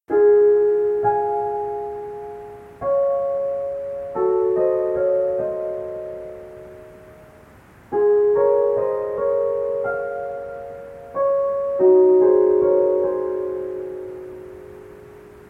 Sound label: keys